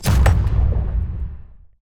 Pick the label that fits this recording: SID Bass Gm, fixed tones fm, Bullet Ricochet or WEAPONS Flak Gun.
WEAPONS Flak Gun